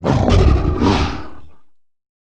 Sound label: Canine Long Roar With Bark, monster sounds; The Decline Of Warriors; Monster Sounds Volume II